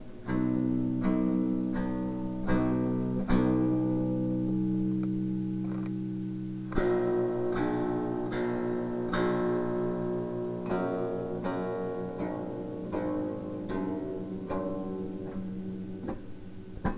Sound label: aeolian strings